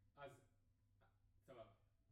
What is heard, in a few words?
Piano B